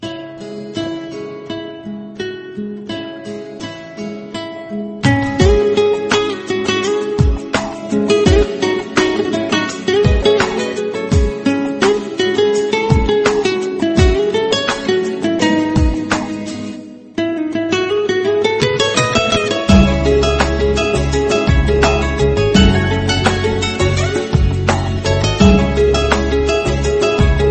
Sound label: guitar melody ringtone